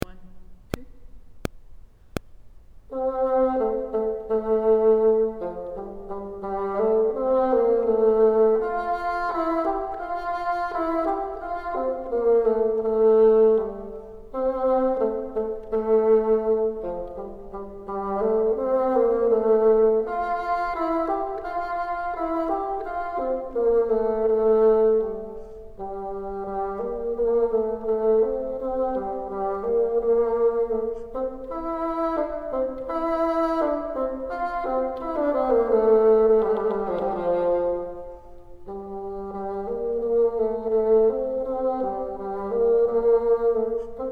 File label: top, bassoon